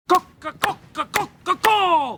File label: chicken sounds; gob bluth